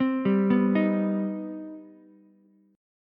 C Minor Major 7th, Ukulele; arpeggiated; JGuitar

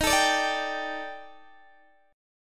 D sharp Major 7th Flat 5th, down, Guitar, Open D

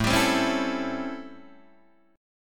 A 7th Sharp 9th, Guitar, Open D

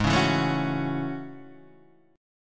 A Major 13th, guitar

